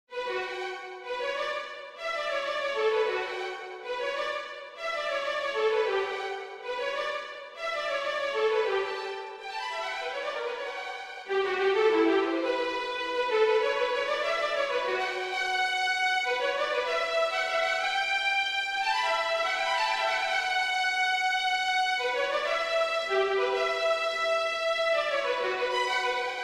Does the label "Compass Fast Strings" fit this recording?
Yes